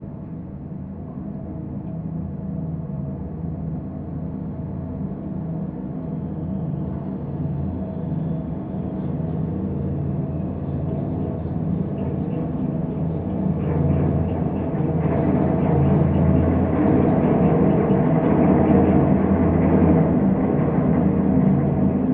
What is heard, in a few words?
TRAIN PASS BY, Arrival and departure, L train; Collected Transients; Diffuse City